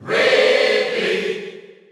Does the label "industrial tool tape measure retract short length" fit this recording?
No